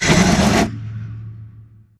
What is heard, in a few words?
sliding door ext verb close slide; Titanfall 2